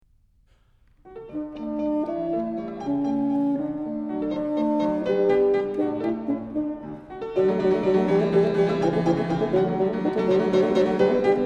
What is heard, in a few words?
Bassoon Sonata No.2 in E flat